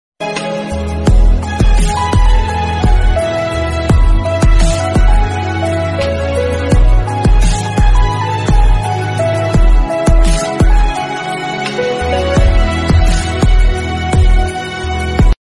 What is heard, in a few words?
high sound romantic instrumental music tone ringtone